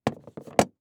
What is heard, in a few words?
Industry Tool Box Open Compartment